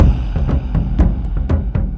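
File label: Music Shield; From Dust; PS3; Xbox 360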